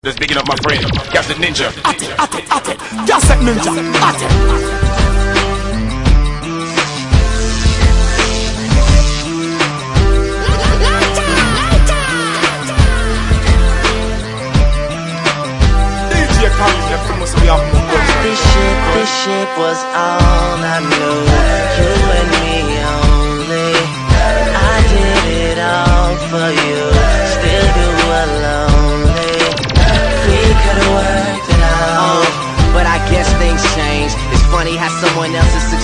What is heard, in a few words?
hiphop